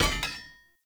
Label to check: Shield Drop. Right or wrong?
Right